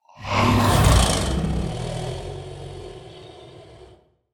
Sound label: alien grunt